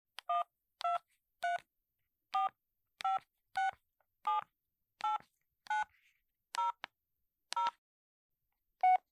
Звук набора номера на стационарном телефоне

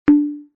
Звук невозможно выбрать

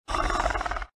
Звук рычания велоцираптора

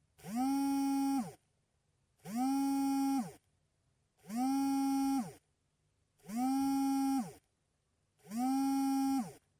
Вибрация телефона в кармане

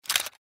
Звук затвора камеры nikon

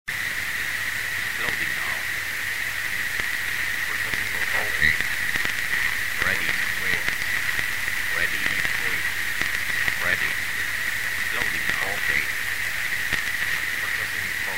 Звук радио которое чуть слышно

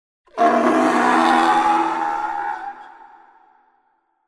Звук рычание трицератопс

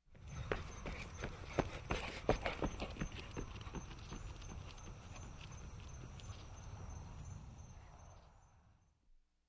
Звук шагов Бег слева направо

